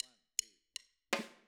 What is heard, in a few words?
Hi-Hat, 162 BPM; drums; Drums from the Big Room The Mixes; Take Your Toms Off